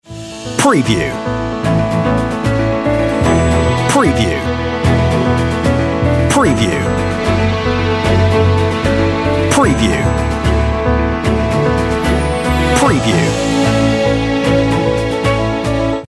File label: On Hold Music